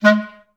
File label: STAC G#2, Orchestra; Best Service; Peter Siedlaczek's Advanced Orchestra